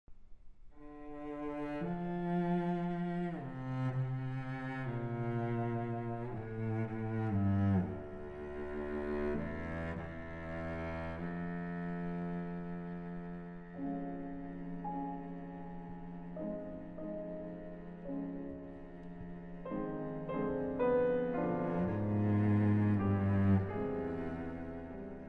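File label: cello sonata; thuille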